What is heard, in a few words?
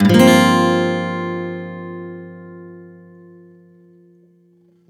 One Shot G min11 downstroke, Acoustic Guitar; Sonic Foundry; Songwriter Acoustic Guitar Companion; One Shot Chords